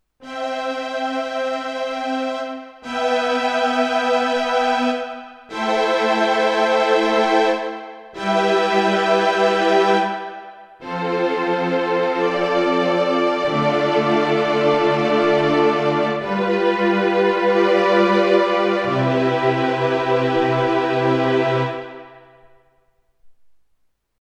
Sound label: Piano Strings; Roland Go Piano 88